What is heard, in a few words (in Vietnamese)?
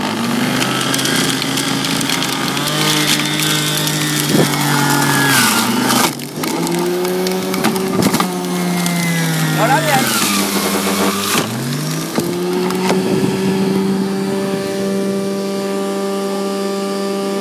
Máy xay sinh tố Blender